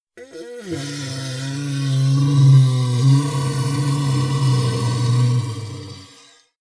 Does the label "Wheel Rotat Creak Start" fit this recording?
No